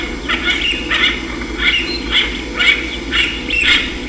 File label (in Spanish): pato barcino